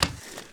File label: drag step; Doom